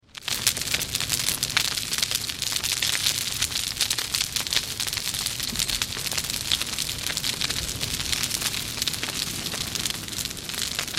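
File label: campfire sound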